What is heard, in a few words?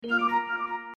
slyder off; samsung d900i; Resman55Pro patcher